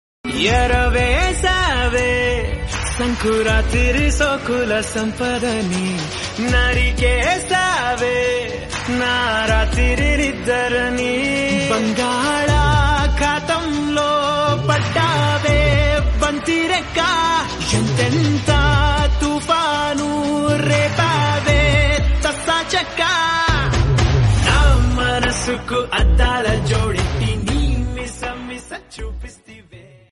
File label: Shradhanjali songs, Telugu